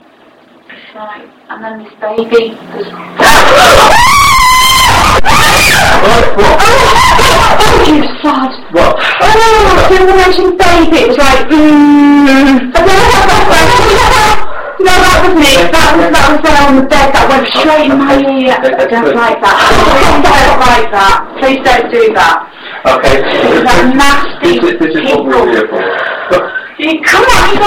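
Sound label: moan and screaming; room 12; ghosthunter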